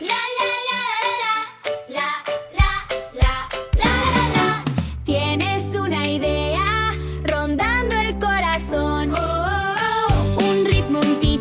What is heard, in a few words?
jingle